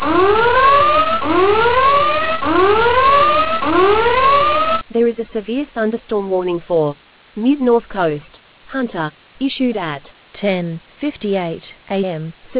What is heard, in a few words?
storm audio